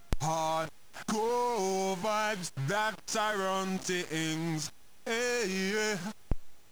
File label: sbpro2 opl3